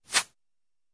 Arrow Sizzle Bys Ligh; bow & arrow bys

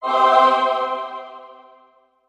Music Effect Choir, choirs; Short Female Choir Chords

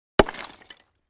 Clay Chimney Wall Hit, concrete & brick

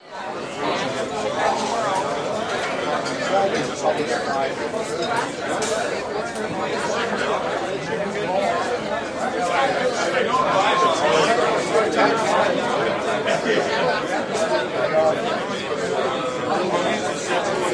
Bar Atmos Loop Med Cro; bars & discos

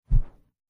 Single Fan Blade Whoosh

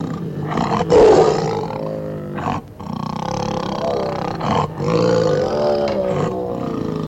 Tiger Growl Roar; big cats

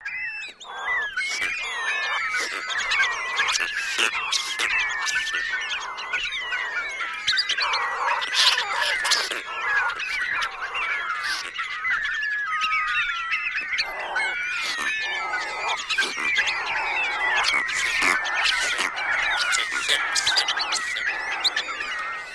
Group Bats Squeaks

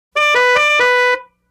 Old Car 2 Tone Fanfare

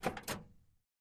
Camaro Door Open; chevy camaro

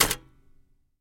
Typewriter Back Spa; typewriters

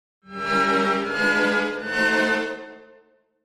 Violins Shrt Reminde; strings

